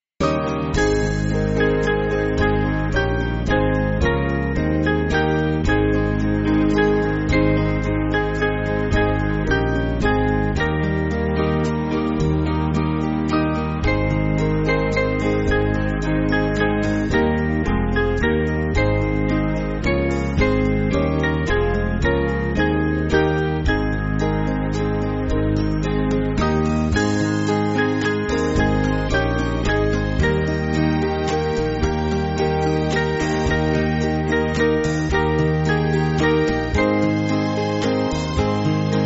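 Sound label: Music Backing Files for Churches; The Door Of Salvation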